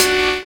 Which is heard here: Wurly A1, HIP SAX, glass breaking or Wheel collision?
HIP SAX